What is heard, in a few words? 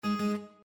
User Account Control